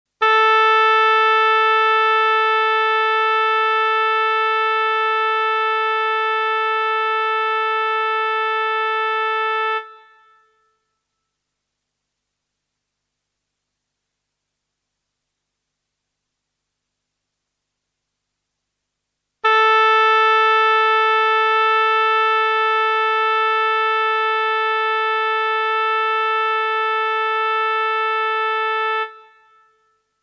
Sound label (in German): Stimmton a1, Klavier; Blaeser-Team